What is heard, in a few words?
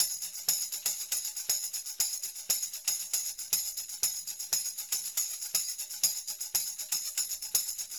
tam, Percussion; Big Fish Audio - DOM